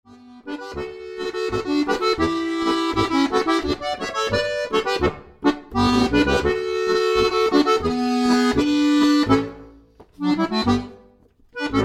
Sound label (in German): boarischer